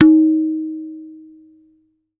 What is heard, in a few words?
Perc Tabla Tun